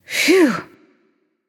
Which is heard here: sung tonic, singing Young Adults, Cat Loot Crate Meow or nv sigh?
nv sigh